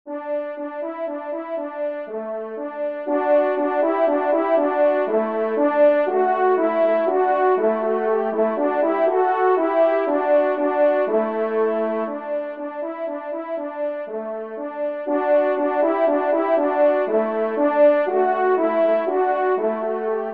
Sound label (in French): Menuet Do Maj TRP; 1e Menuet; Corrette